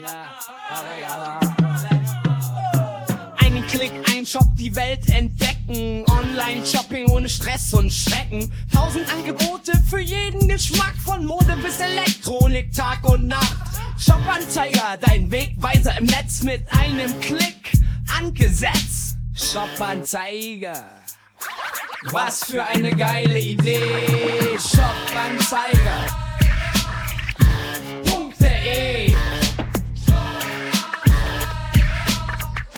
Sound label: Rap